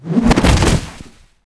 Rhinoce Hunter die

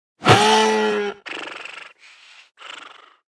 Stag Action

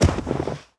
Ice Bear Walk; Seer; GamigoNA

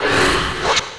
Old Fox Atk

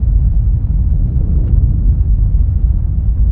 Door

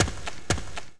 Ratman Walk

